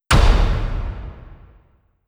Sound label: Big Door Slamming